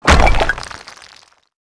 stone crash; World of Tanks Blitz

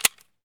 button click; Escape from Tarkov

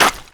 grunt gravel run

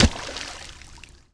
water run shallow

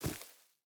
footstep rubber boots grass run; Rust

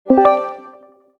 reconnect; Discord

